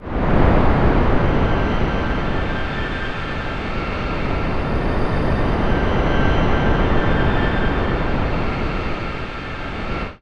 drone, Alien & Drones (atmo); ambient; Chillout

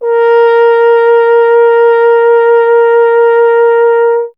F. Horn

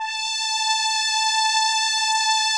SOLINA, String Machines

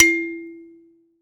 Finger, Prepared Kit; Battery 3 Sample Kits

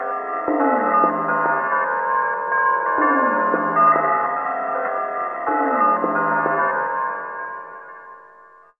WEIRD BELL; AMG - Vince Clarke Lucky Bastard

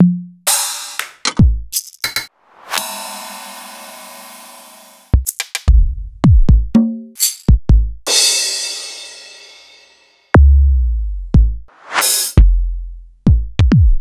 EMU Orbit

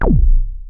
OB C2, Rare Synthesizer; Hollywood Edge - Giorgio Moroder Rare Synthesizer Collection; OB 4 VOX.

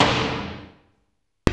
snare aargh; Roland L-CD701; Snares 1; Sn Modules 1

